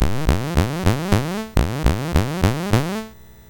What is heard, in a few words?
BASIC sid MOS6581R4, GAMES; Sword of Fargoal